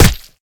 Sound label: hit, knuk; svencoop; ins2